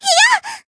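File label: Voice damaged, Japanese; sincere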